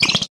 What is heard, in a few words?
cry